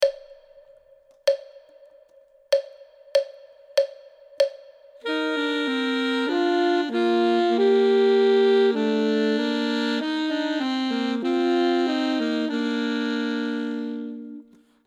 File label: duet; Ten Easy Duets